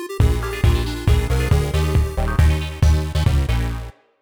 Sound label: Lose Life; Donkey Kong Country 2- Diddy's Kong Quest